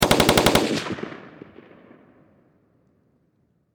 Warfare Machine Gun M16 Long Burst Distant Perspective; Farther Away; Machine Gun Sound Effects